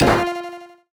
Retro FX; Cymatics; Video Game Sample Pack Vol 1

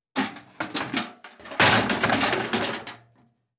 Bucket Grab and Slam Impact; Fairlight Sound Lib

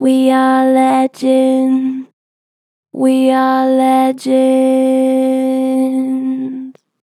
Gen Flat We are legends, Vocals; Harmor Vocals

